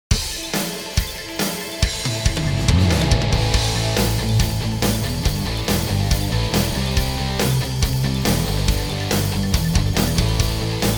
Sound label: hard rock